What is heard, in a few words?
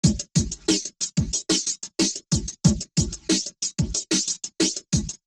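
Percussion Beat 92bpm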